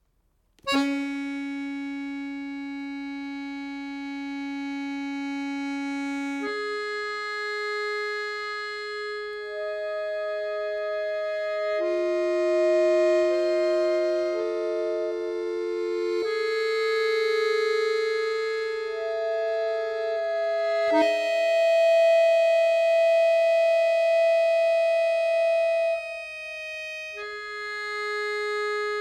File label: ACCORDION